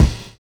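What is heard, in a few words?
JUNGLE snare; Univers Sons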